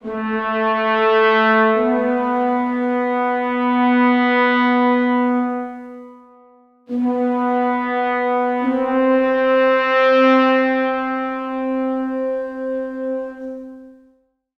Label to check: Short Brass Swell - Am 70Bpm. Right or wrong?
Right